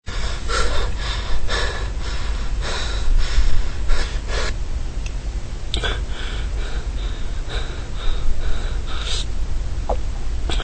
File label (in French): Essouflement, Maladies; bruitage